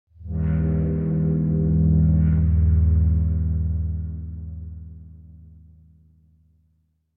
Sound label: Bass Horn Reminder - Higher, Duet; Hollywood Edge; sound designer's toolkit v2